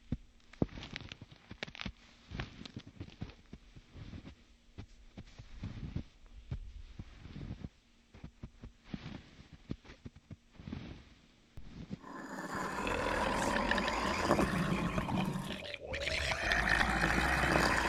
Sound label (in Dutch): zombie rustig slepend